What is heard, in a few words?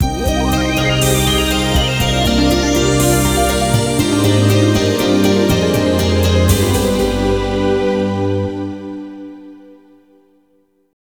Game Over (Arranged)